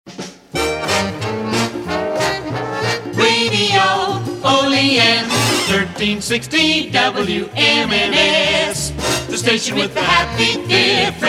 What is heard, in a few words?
Sig Workhorse